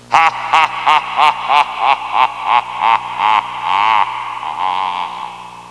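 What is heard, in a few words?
cackling; Sound Library 2000; Wayzata Technology; Music In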